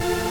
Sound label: synth; osu